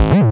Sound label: Offbeat Bass G#; Vengeance Samples